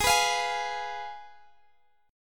G sharp Minor 6th, Guitar Open D

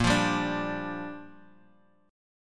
G Minor 7th A sharp

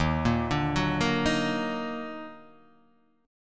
Eb Minor Major 7th Eb down, chord; EbmM7; arpeggiated; JGuitar

